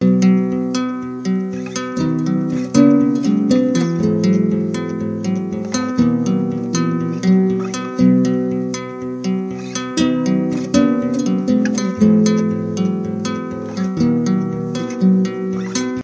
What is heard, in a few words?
dogs guitar, folksy; REMIXABLE COLLECTIONS; Folksy Guitar and Voice Stems